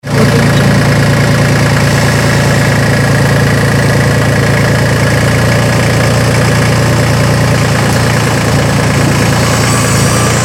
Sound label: a tractor